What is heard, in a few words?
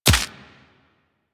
Bullet Impact Head Hit Notification; Warframe; Weapons